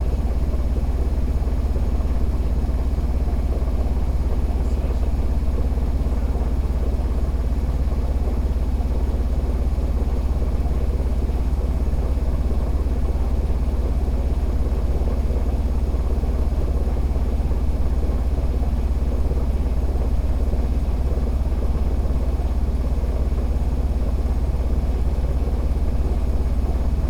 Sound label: boat engine